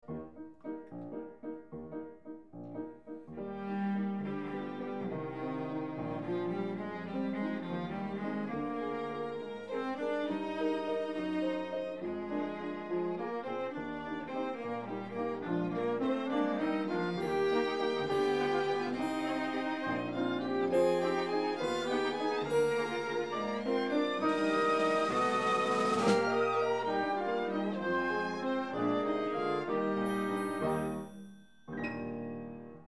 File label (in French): la valse de l amour